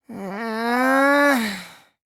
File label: whine; kokichi